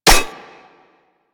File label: Vial Ability Orb Spawn; Warframe; Warframes; Alchemist